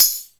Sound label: Perc, Alesis DM5